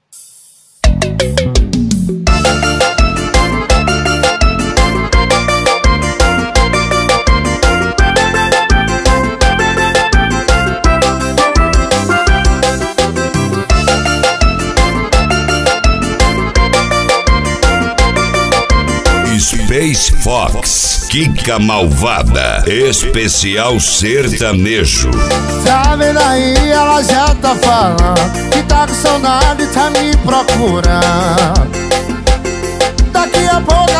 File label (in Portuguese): sertanejo